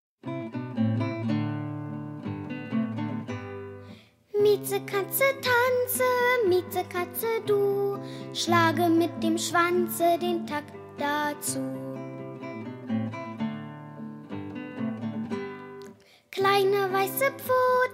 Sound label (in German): Kinderchor; Miezekatze Tanze